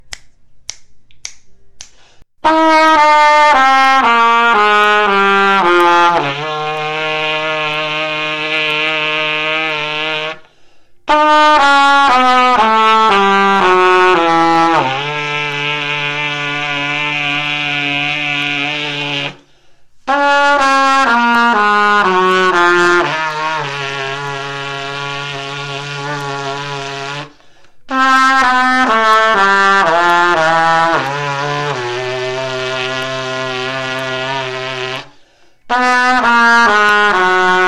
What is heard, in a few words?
pedal tone exercise, trumpet